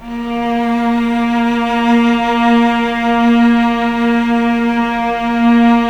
A#2 LEG MF, VCS LEG MF E, The Ultimate String Collection